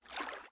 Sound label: water, liquides; sfx